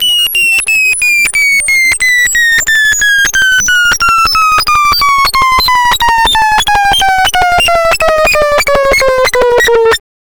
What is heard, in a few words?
TELEPORT, Hard & Loud Techno; USB Soundscan vol.01; AKAI